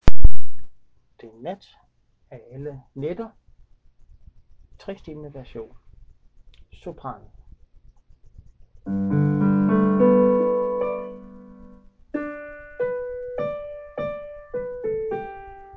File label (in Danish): sopran 3 stem; Den nat af alle naetter